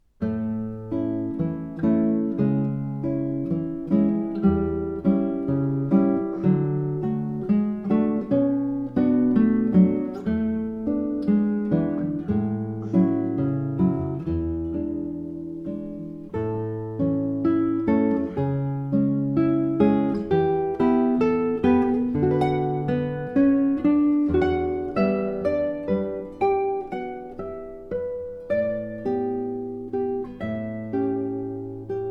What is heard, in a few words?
Douce souvenance (Arr. for Guitar)